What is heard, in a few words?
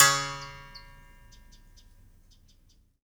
Berimbau; Roland LCDP11 Africa VOL-1